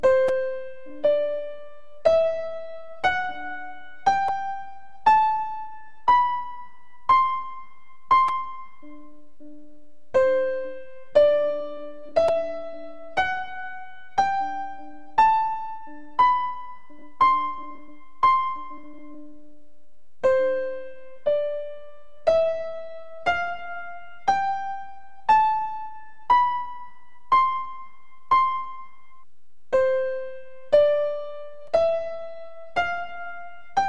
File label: greek 5 and pyth modes